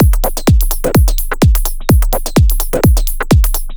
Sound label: Hardgroove 127bpm, House Drumloops; 90 s Sample CDs; Zero-G House Fabrik Drumloops; ZG Drumloops